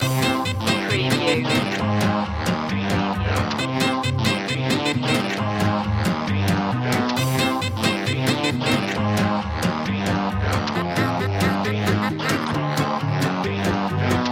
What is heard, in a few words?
loop